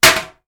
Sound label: Toilet seat down